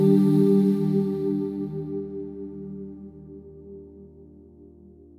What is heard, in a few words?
Outro Pad; BB 2HTC Samples Vol 4; Pads